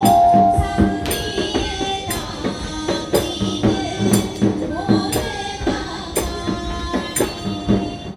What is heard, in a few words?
MIX, India; Best Service; Ethnic Journey Sound Cube